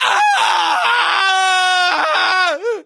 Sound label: scream, Screams; civ13; civ14; Voices